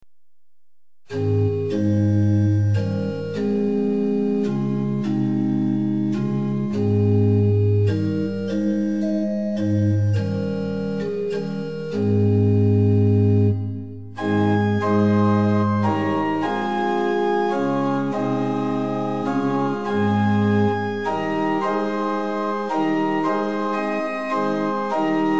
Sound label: Pitch 440 Temperament Meantone